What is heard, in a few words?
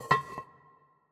Jar; Legend of Keepers